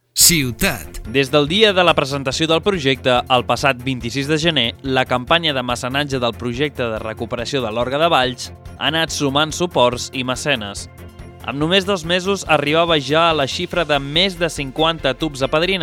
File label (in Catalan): Orgue